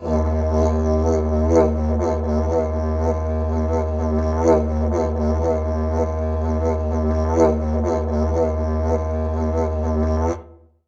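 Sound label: didgeridoo; Winds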